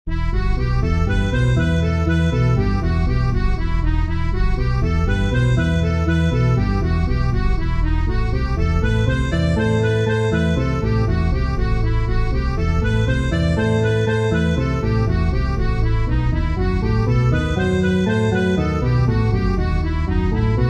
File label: Gypsy Harp Complete Listen 120bpm Organ Bandoneon